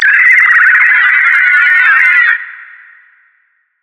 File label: Lithomorph Death; Special Creatures; DynamicEuropa